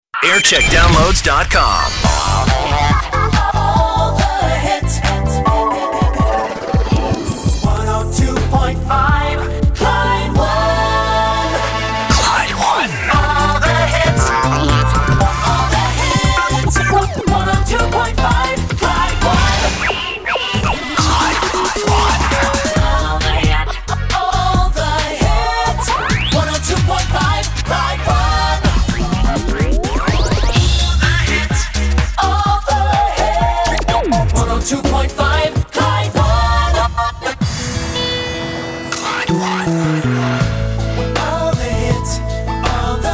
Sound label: jingle montage; clyde 1